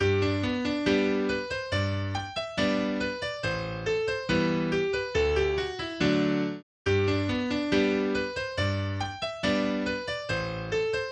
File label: Wood Bridge Assembly, english dance tunes; eighty english dance tunes